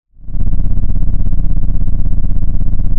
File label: Sound of an engine revving